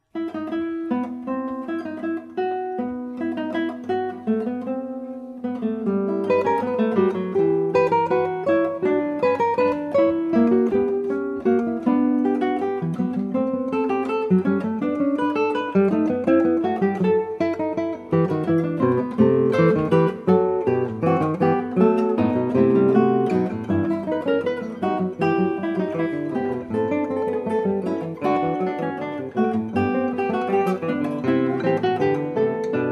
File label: fugue, guitare; bwv847 bach